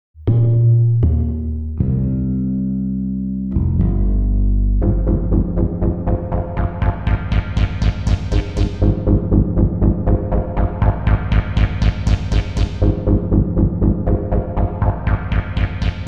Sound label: Bass only